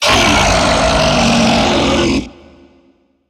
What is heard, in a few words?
beast vocal switch side